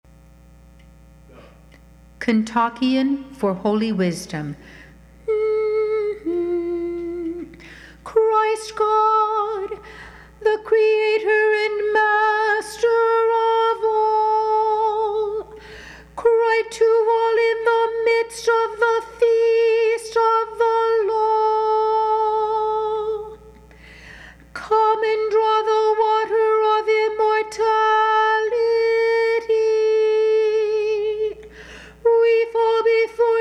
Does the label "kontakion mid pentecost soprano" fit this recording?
Yes